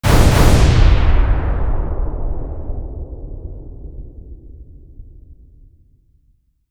explosion

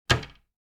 cabinet door close

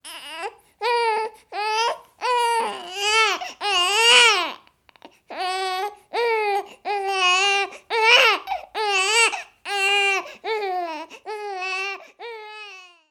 baby crying; human

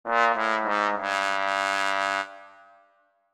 fail trombone

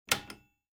switch

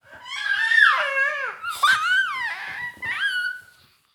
toddler amused; human

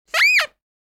squeeze toy

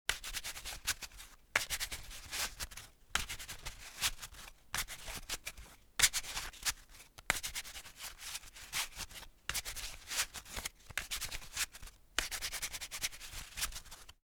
shuffling cards

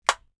cell phone flip

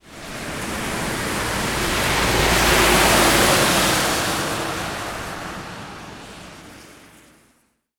truck pass by wet road; transportation

